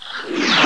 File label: Suck